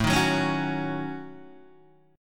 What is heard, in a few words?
A 6th Flat 5th, Guitar, DADGAD; 7,6,4,6,4,4